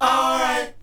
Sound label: VOC